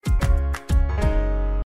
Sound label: Ringtone; Hamilton